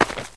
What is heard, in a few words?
softshoe generic, footsteps